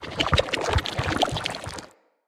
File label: Corrupted Slug Foley Move; Creatures; Movement